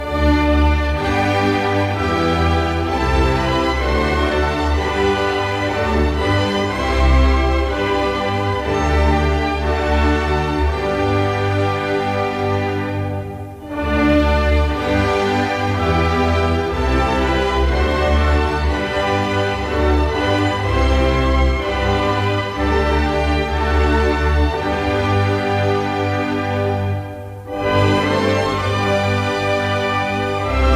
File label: dutch national anthem